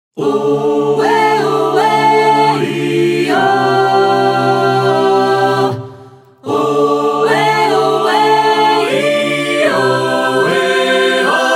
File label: irish country dance, a cappella; hlpc 2022